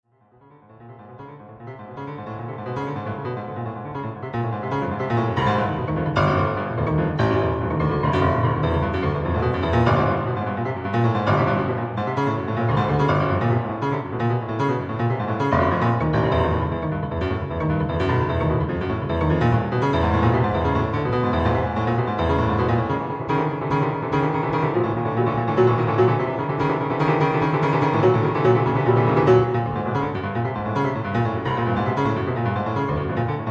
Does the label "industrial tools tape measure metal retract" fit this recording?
No